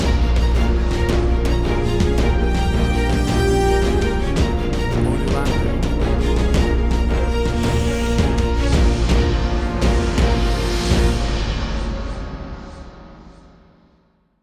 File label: Big Hollywood Action Movie Music